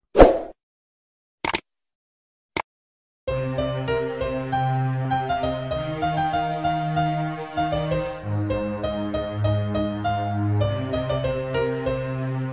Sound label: bgm musical; manmadhan; str; gold smith